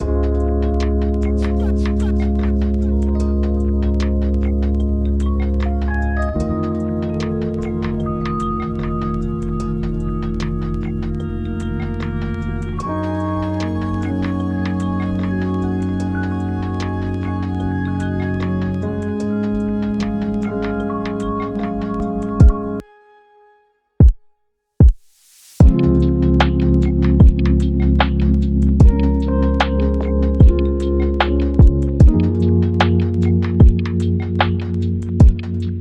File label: Lofi